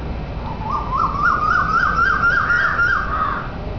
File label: eudynamys scolopacea; srilanka